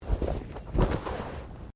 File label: Body movement Clothing Foley